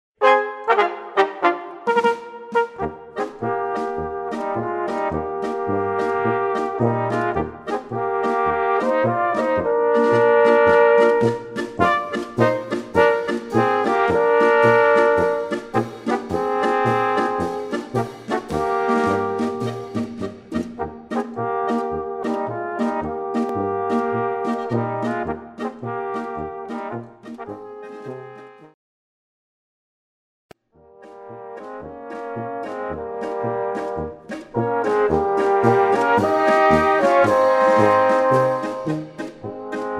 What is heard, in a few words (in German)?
Weißwurstpolka